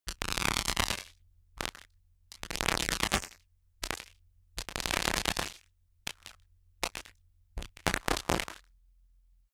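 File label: simple crackle sequence, science fiction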